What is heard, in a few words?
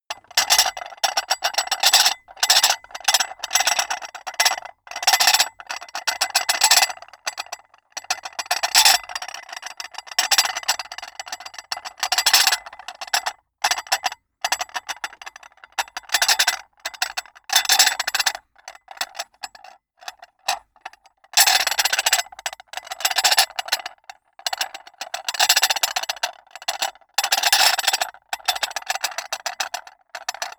dishes cups rattle hard; atmosphaeren umwelt; gastronomie